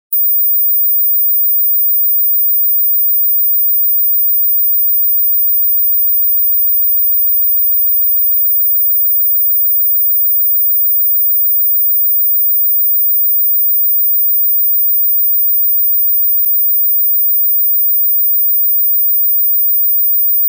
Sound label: High Pitch Noise